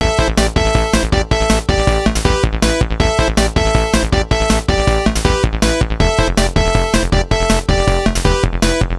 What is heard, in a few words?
loop 80